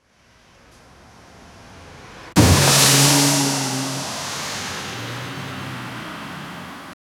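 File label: car crashing in to pool; accident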